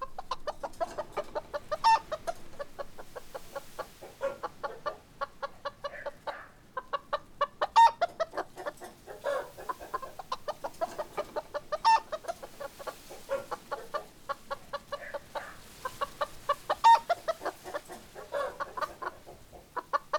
outdoor loghouse rooster; anemoi

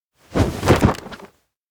oldnote drop desk; anemoi

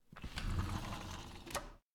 window peek out; anemoi

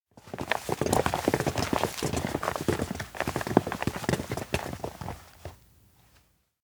steps indoor post office thud; koyomi; anemoi パッケージ版 1.1

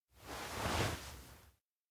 look up; anemoi パッケージ版

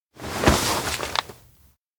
note hug; anemoi

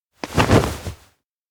hug; anemoi

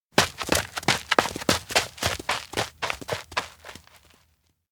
steps outdoor run; mugi jet; anemoi

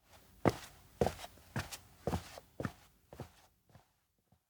steps indoor observatory to sink; anemoi パッケージ版 1.1

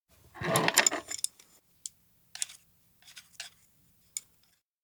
bowl hold; anemoi

